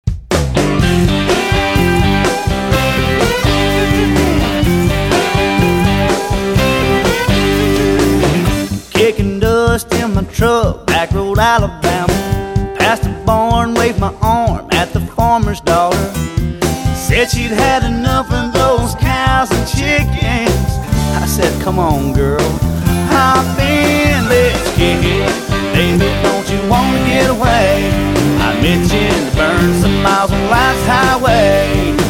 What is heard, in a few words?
kick